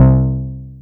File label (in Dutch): Dikke Bass, FM; Polyend